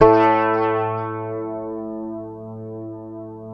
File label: Fingered bass A2 R; Sampleheads; Will Lee Bass Library